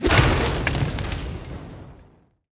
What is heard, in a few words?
Impact; portal quest; android; House Skill1